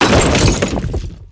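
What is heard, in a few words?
break stone small; Spearhead Demo; MacFormat 2003 June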